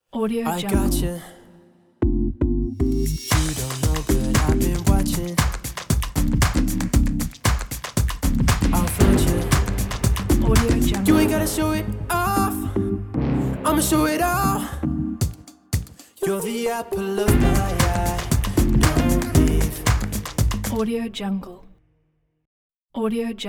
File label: fashion intro promo